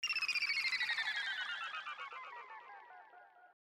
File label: bubble down